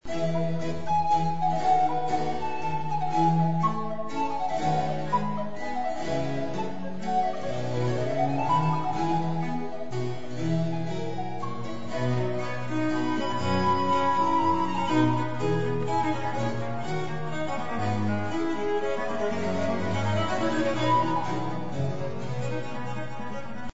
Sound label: trio sonate f maj; telemann